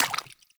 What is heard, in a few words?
Footstep Default; Warframe; Pirate